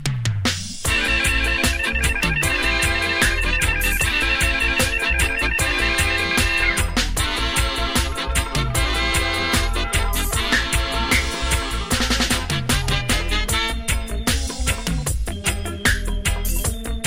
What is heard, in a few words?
african organ; african organ pickout